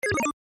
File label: 80S Videogame Style Bleeps; Video Game Sound Effects; electronic sound effect